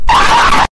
the screamer

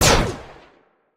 pistol

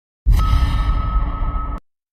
suspense echo

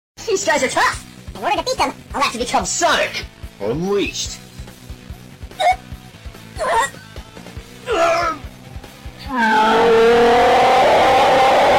ahhhhh; sonic unleashed

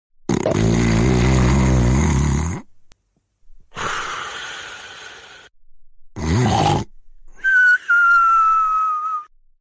snoring

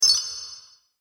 sparkle hitsound

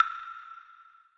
clave

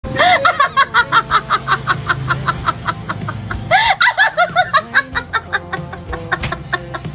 aa hahaha

